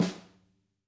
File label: SNAR, Drum Kits